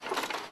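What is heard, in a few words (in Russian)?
звук когда передвинули большой металлический предмет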